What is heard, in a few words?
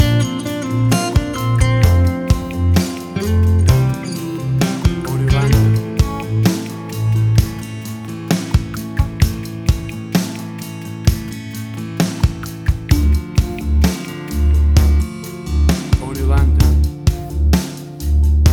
Acoustic Guitar Tension; 15 SEC MIX